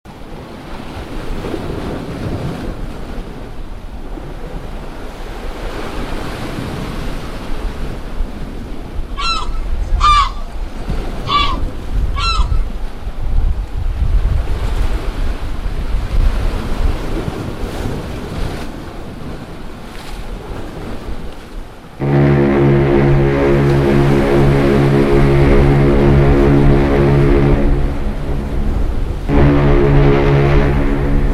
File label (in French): Montage Bruitages Ambiance